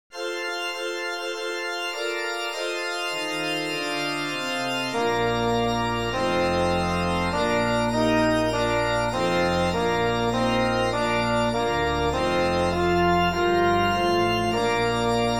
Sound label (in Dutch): Trompetfeest